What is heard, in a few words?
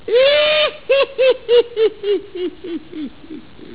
Witch Laugh; horror; horrorgifs